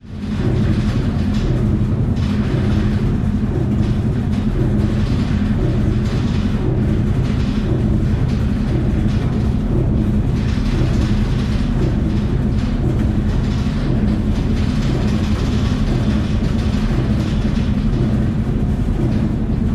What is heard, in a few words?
WWII Liberty steamship shaft rotates.; Boat, Steamship Engine, Steamship